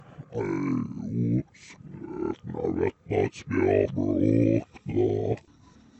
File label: jingiel; musician